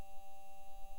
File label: mic, mars2020 edlcam